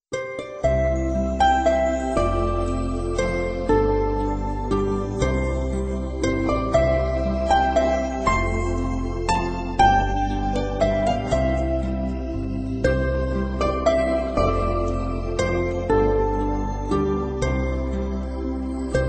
彩铃背景音乐

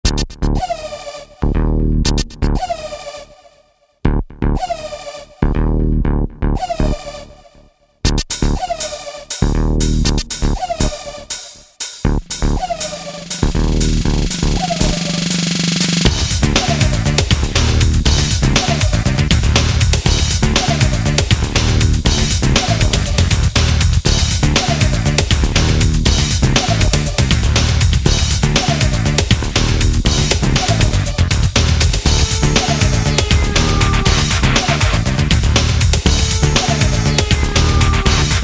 片头音乐; 1分以内

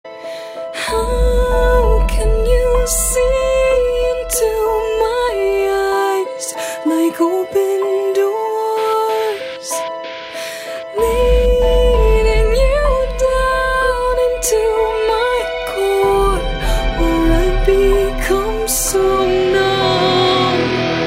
伤感的背景音乐; 1分以内

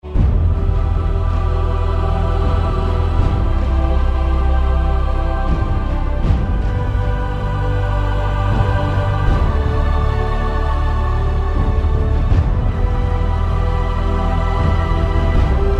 专题片背景音乐

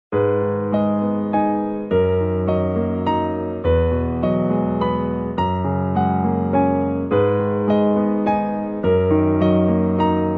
轻柔的背景音乐

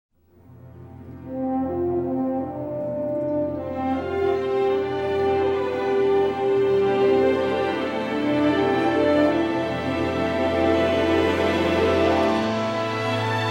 大气的背景音乐

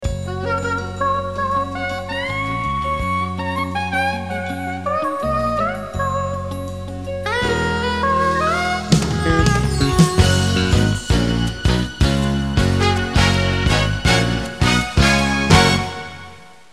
片头音乐

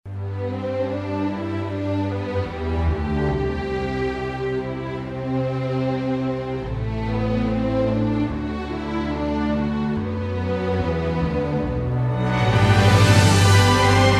专题片背景音乐

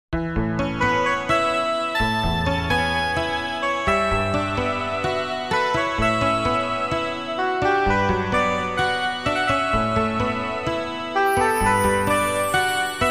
纯音乐背景音乐

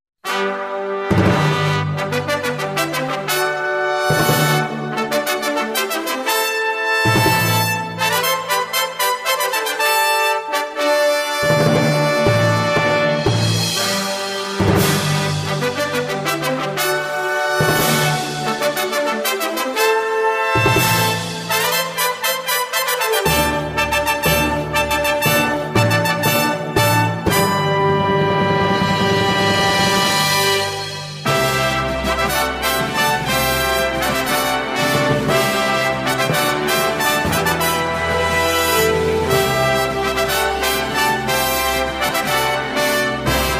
激情的背景音乐

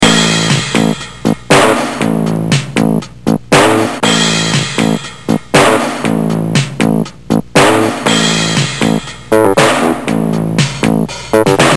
片头音乐

